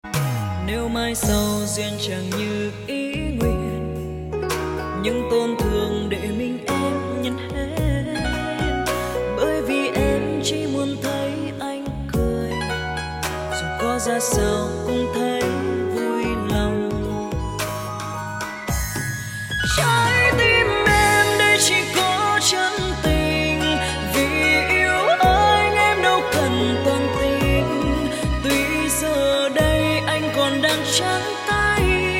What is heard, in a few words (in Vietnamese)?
nhac chuong giong nu